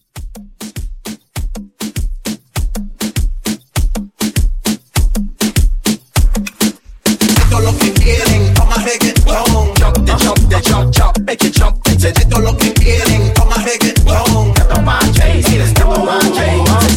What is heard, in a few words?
Moomba Hype Re Drum CLEAN